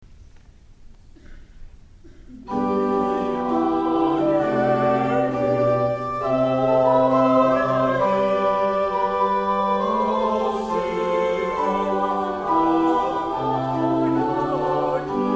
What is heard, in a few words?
Choir Look up the stars again; K Shalom Choir